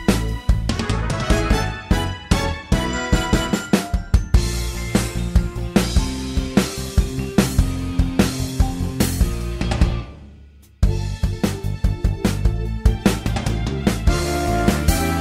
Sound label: Audition Track no vocals; theatre auditions